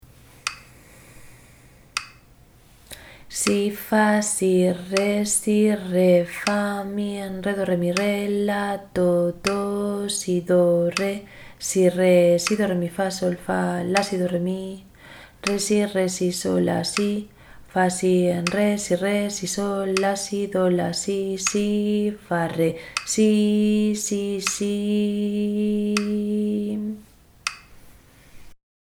ritmo 6-4

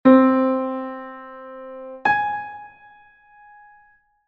intervalo compuesto

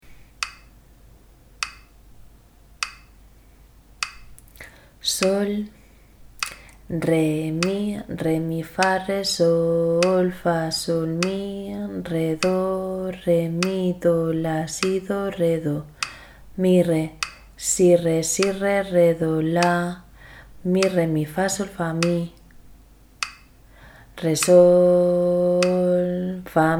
ritmo 6-4

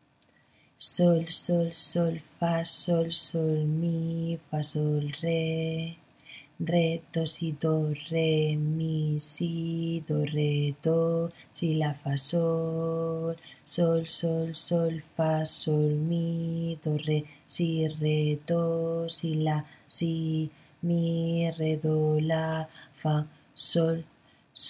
ritmo 6-4; Equivalencias

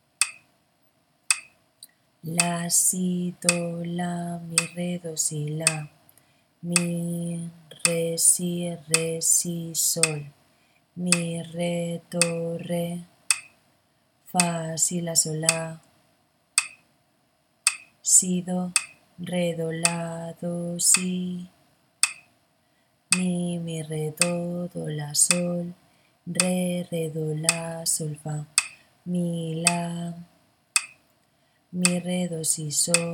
ritmo 6-4

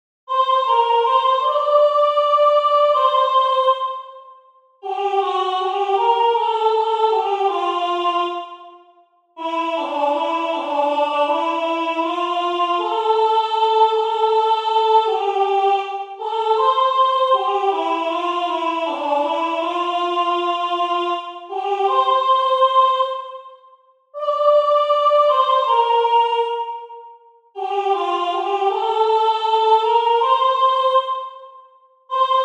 entonacion voz; Entoación con acompañamento

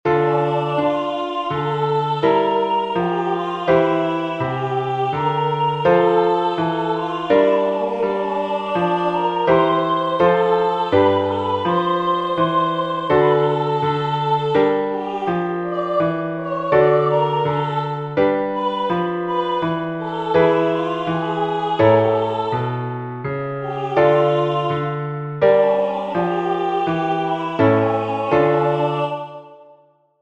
entonacion piano; Entoación con acompañamento; Melodía e acompañamento

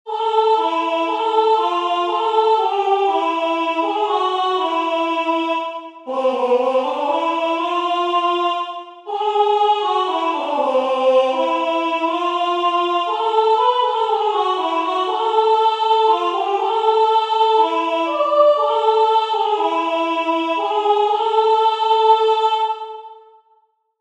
entonacion piano Voice; Entoación con acompañamento

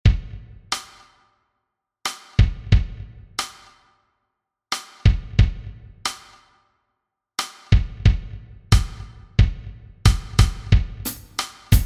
BATERIA; Audio da batería

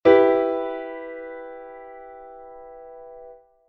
acorde mi menor; major and minor scales and chords

